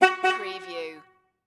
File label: Auto Horn Honk City; sound effects bible